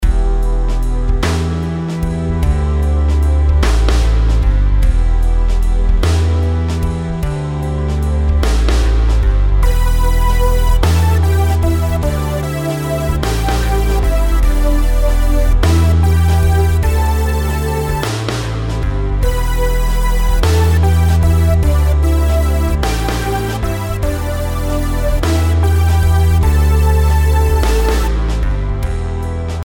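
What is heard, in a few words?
melodies compound 8s E minor; melody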